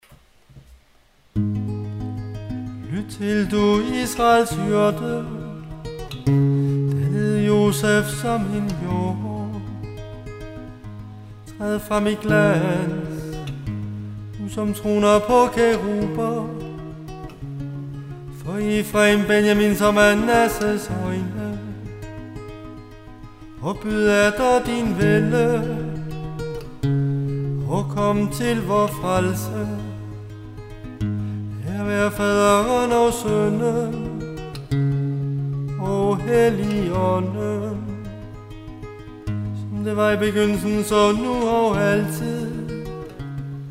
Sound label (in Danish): tone gregoriansk